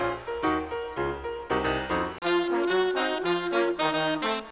piano, Phonky loops

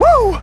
woo

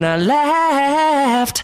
and i laughed SUNG; Voice; actions

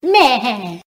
maheheheh, gibbrish; Voice

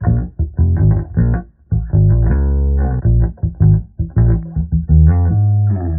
bass, Phonky loops

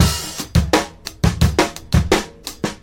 loud clicks, Boombap drum loops

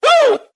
"woo", voice; ooh ahh

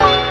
Stabs

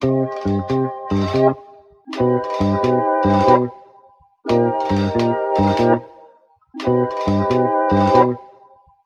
horns, loops, phonky

